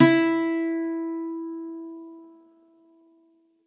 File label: Soft E4, Campfire Nylon Guitar